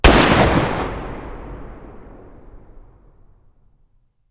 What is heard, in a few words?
svd muzzle mono, weapons